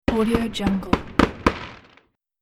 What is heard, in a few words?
fireworks; آتش بازی; urban sounds